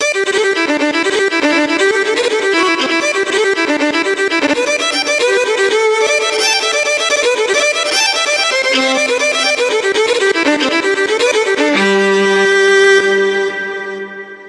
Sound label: Irish Jig